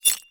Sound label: glass scrape